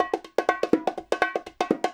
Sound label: Conga, Trip-Hop Loops; Sonic Foundry; Rondo Brothers Trip-Hop Loops & Samples